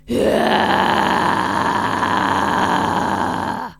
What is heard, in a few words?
Grunt pur